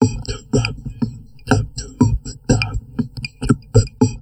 TALK, Drum Loops Crazy Processed

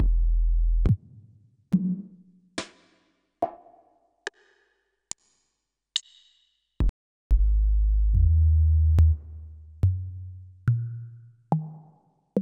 Tenori-On Powerkit; Yamaha

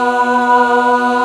TRON FEMAL; E-MU Formula 4000 Series Vol. 3; Analog Odyssey; VK Instruments

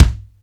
Bd Acoustic, Kick Drums; Zero-G; Soundclash

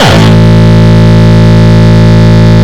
Longkick, kicks

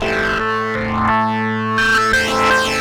Organ A, 85bpm, analogue circuit; musicradar

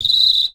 bird call

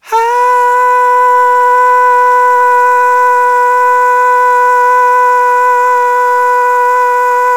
AHHH, Vocal; Polyend

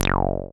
SYN 303, Roland TB-303

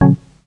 chord one shot organ B, Classic Chicago House; Sample Magic; Chord Shots

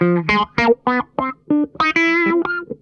NOTE, Funk Guitar; Zero G - Funk Guitar

